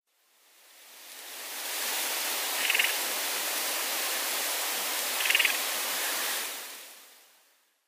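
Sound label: pied wheatear, sakata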